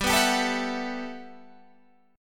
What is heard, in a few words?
G Major 13th